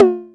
Jomox Tom; techno drum fx; Analogue DrumHits

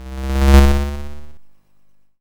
YM Hover By; SFX; ChipShop Deluxe; ChipShop Hits

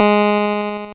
GB Synth G#; SYNTHS; CHIPSHOP DELUXE; CHIPSHOP HITS

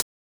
Closed hat, techno drum

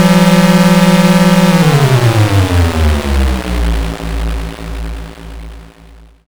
Drone Down; SFX; YM; ChipShop Deluxe; ChipShop Hits

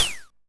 Ym Multi Tom A4; multi-samples; CHIPSHOP DELUXE

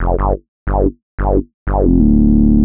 Bassline 90 G, hiphop, 90bpm, Bass; musicradar

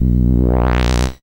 SID Bass Bm, chip; CHIPSHOP HITS

